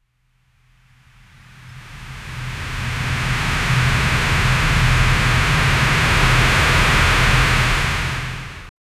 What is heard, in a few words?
wall run effect, acrobatic